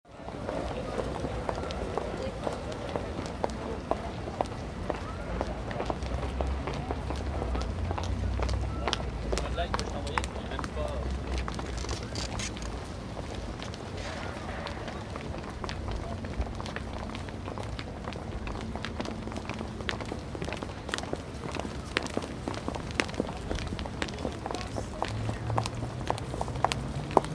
sol urbain martelement multiple

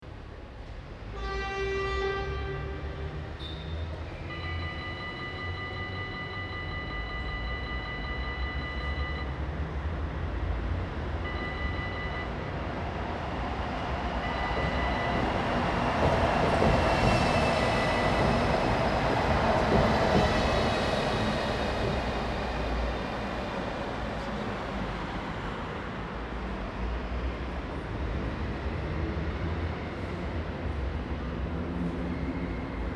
parking et routes sous la dalle

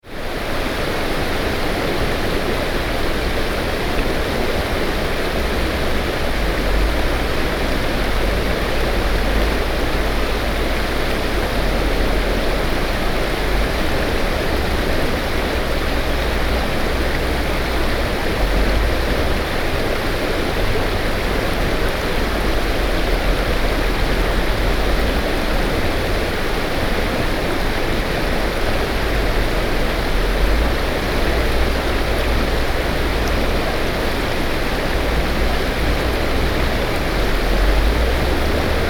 graviere

